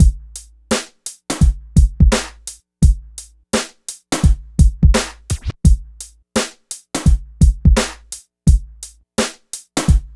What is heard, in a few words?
Beat, Hip-Hop